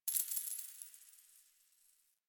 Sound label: Coin Drop